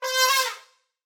elephant

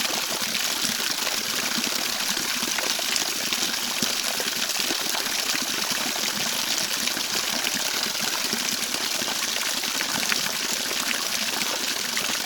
waterfall small

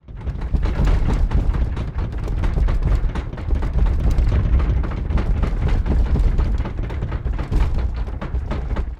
earthquake

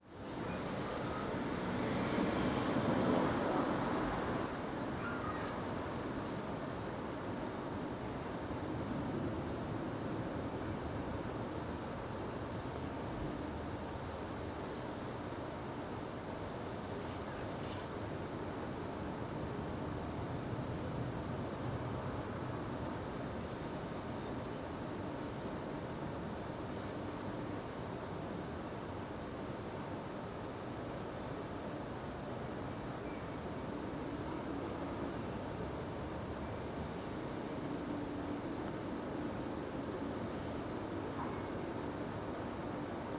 quiet residential area

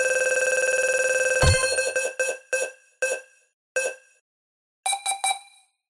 electronic roulette

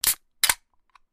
can open

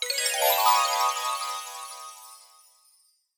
magic stick

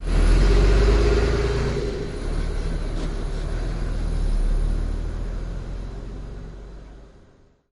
bus start, machine

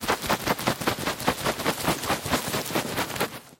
dog digging

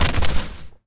down, battle

